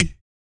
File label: VOC PERC, Vocal Planet Jazz FX BASS PERC